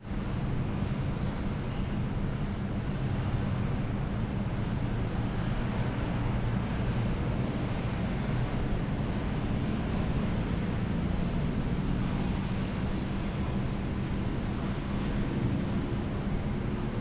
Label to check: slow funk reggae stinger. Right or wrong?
Wrong